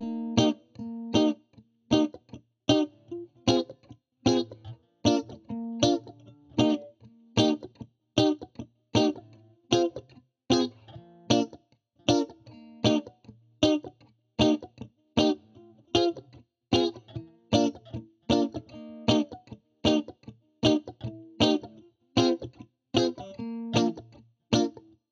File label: Skank Guitar Guitars Loop 77BPM Eb; Bilingual Vol.1